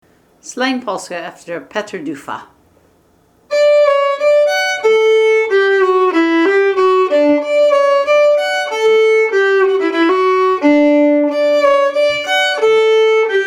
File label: Slängpolska, Fiddle School Music; efter Petter Dufva